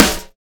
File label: snare actual